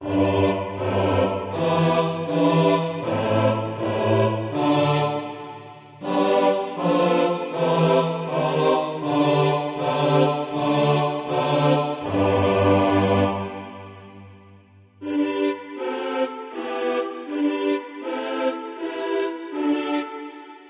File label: bass